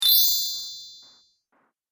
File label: High Chimes Up, Chime Sound Effects; multimedia